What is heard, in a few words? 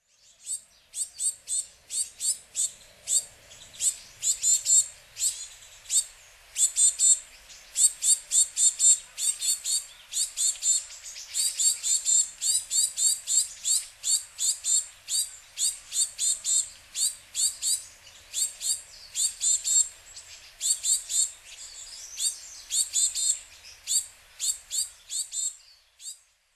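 Japanese Tit; hyogo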